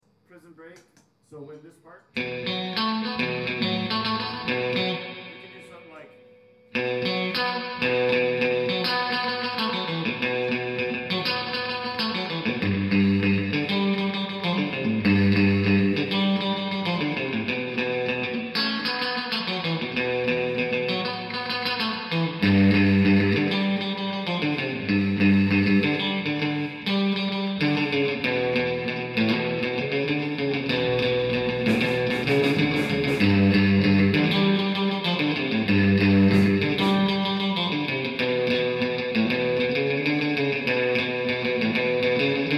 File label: bassline